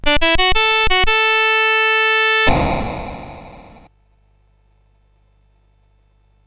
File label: Charge, CSG8580R5; Imagineering Inc